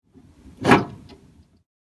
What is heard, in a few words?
Metal Latch Latching